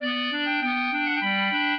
clarinet; Mozart

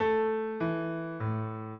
minuet, piano; Mozart

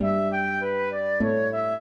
trio, flute harp; Mozart

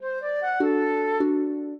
trio, flute harp; Mozart

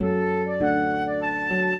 minuet, flute harp; Mozart

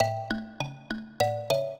minuet, mbira; Mozart

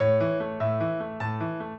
minuet, piano; Mozart

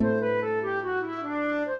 trio, flute harp; Mozart

